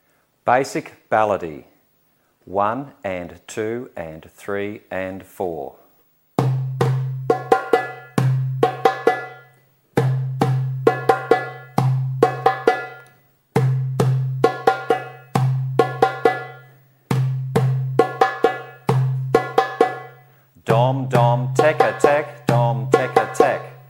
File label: Basic Baladi